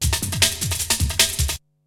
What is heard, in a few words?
BREAKBEATS, TECHNO